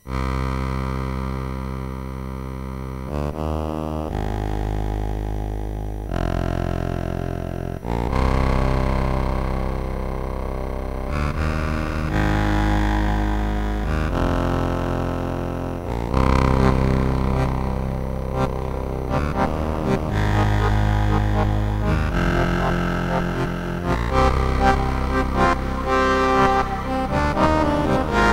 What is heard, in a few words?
SID'esizer